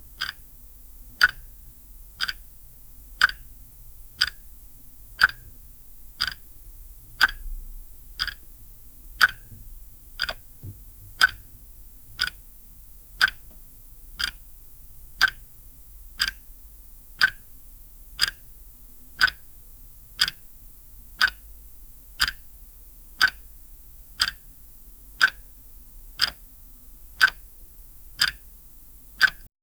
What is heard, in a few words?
light ticking of a clock; ambience